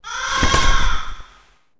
Crow; Detective Conan Runner Race to the Truth; Android, iOS, Mobile; Serun

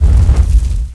Fire Cast; Knight Online

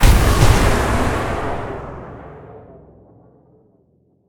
Void Quake Impact

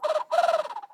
Woodwind-Pigeon; Physicist

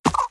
Enemy Poult Walk

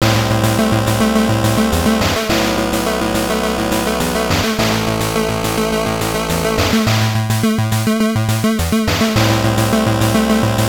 Start Engine; Race Drivin'; GB

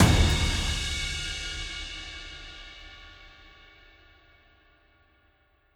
Loose Champ; Legend of Keepers

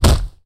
Toaster; gamerip

